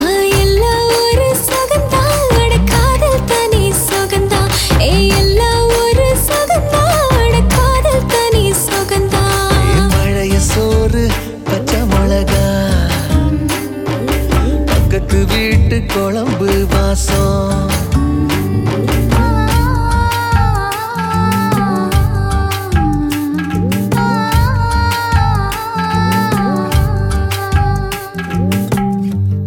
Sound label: female, song; Tamil Ringtones; Thirunaal